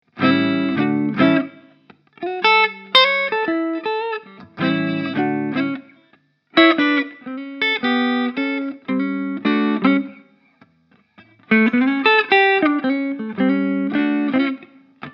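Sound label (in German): Ibanez AS93FM Hals clean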